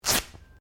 Clothing Velcro Rip Short

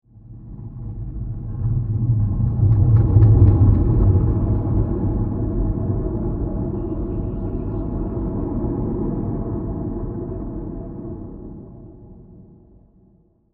Slow Ghosttrain Transition; Scary Soundscape; Horror; Crafted Signals

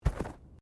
Body movement Slide; Body movement foley to simulate falls and deaths; Bodies; Human

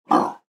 Pig Short Grunt; Animal

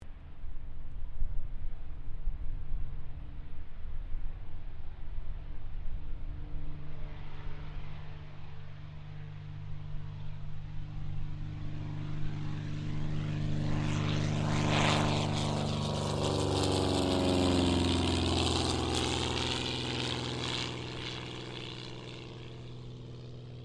AIRCRAFT CT4A TAKEOFF; CT4 military trainer aircraft; Airplanes; Vehicles

